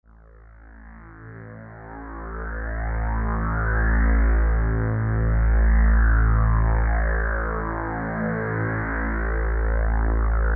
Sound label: Wild Ocean 120bpm; MASTERTRACKS